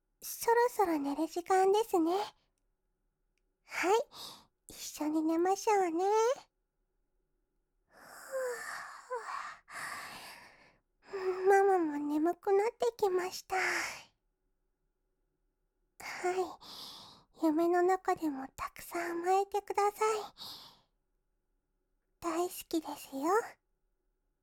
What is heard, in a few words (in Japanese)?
夜 おやすみ＋寝息ボイス; 日常管理編